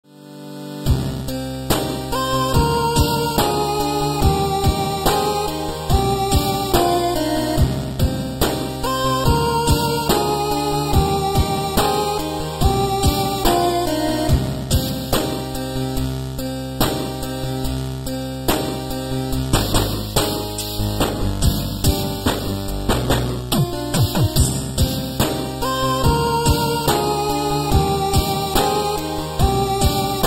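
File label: 8-bit music for cell phone ringtones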